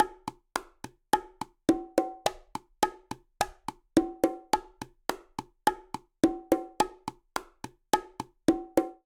Charanga Cha-cha-cha Bongo 106bpm, Latin Percussion; Ableton; Factory Packs